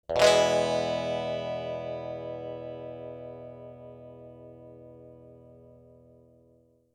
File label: strum cocked wah manual